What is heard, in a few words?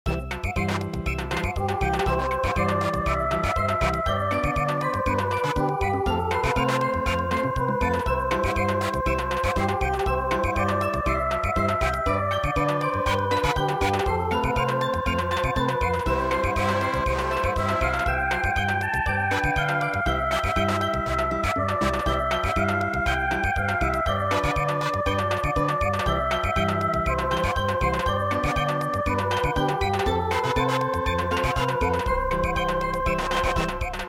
Samba, traditional